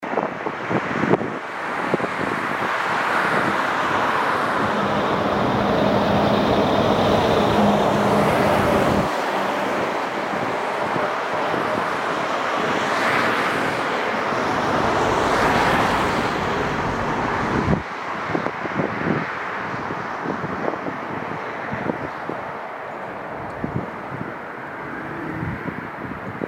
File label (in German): Ambient aussen